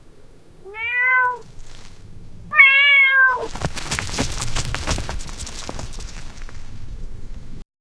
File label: cat sprint through sand; feline